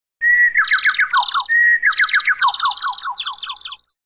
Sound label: bird chirp short 16k; om-pursuit